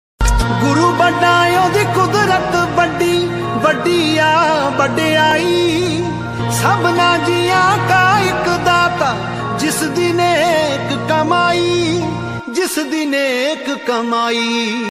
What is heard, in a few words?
Gurbani ringtone; dharmik ringtone; waheguru; baba nanak